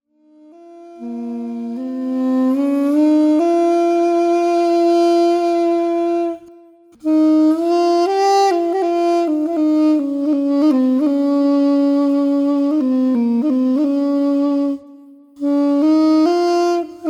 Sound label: taknavazi duduk, تکنوازی دودوک